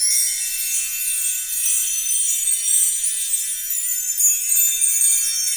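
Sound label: bell tree, 3D Bell Space; E-MU Producer Series Vol. 5 – 3-D Audio Collection; 3D Percussives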